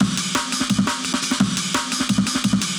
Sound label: Tin Pan, Loops, breaks; m8 breaks